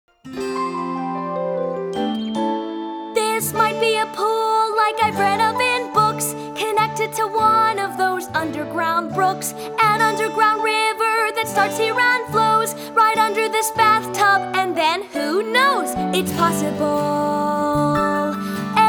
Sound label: vocal audition cut